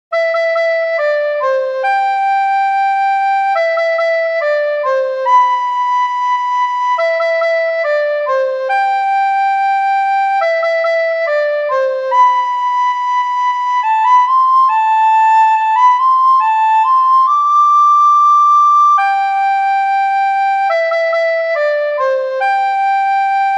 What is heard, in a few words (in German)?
nur Floete; Pasquino Song